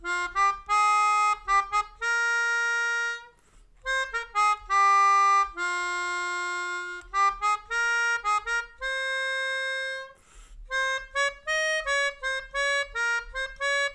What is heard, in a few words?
Melodica